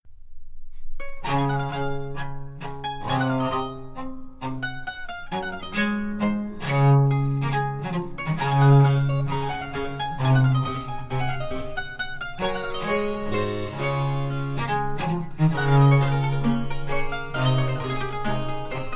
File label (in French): SONATA Sol Majeur Allegro